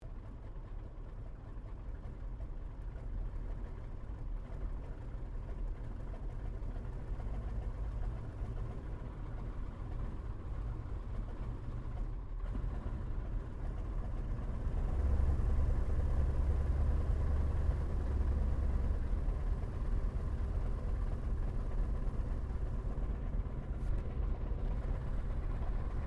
AIRCRAFT SOPWITH PUP REPLICA TAXI SHUTDOWN INTERIOR; Airplanes; 1916; Replica of a 1916 Sopwith Pup